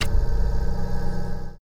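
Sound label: button on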